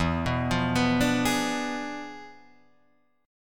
E 7th Flat 9th E down Guitar Drop D

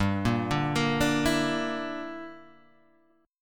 Bb 6th Flat 5th G

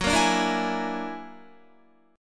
Gb Augmented 9th Gb down Guitar 4F,4A,5C,5G,5C,6E; Listen to Gb+9 strummed

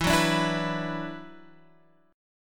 E 7th Suspended 2nd, down; E7sus2; strummed

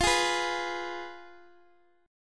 F Diminished Guitar Half Step Down

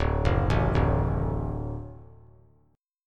D Major D down, D Chord; D arpeggiated; JGuitar

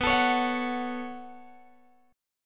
B 7th Suspended 2nd, Guitar, Open G

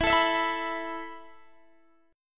E Suspended 4th E down Guitar Open D

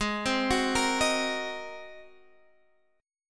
G sharp 9th G sharp Guitar Open D

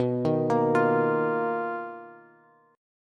B Major Sharp 11th, Bass, Bass Standard; BM#11; arpeggiated; JGuitar

